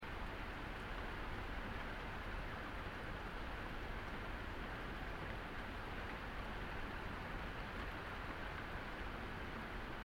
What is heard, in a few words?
rain